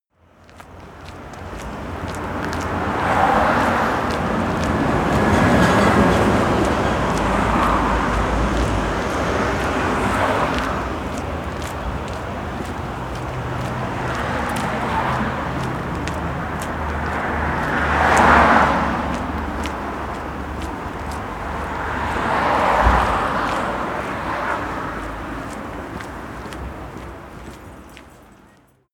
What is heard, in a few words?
walking down the street